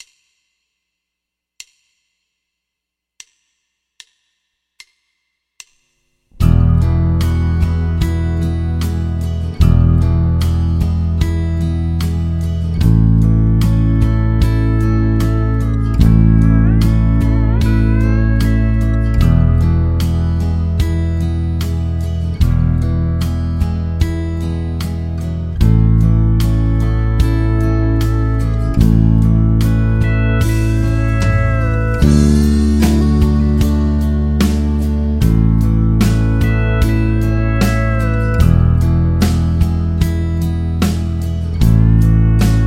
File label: Whole Notes Country Full Track 75, Bass; Bass Road